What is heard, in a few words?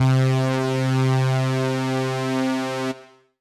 Synth C, Synth Prophet One Shots; Electronisounds